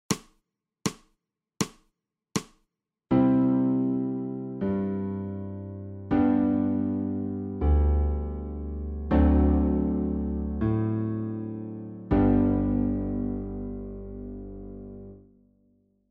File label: SOPRANO